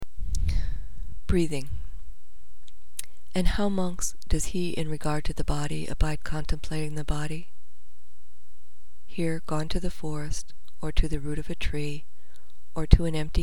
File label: breathing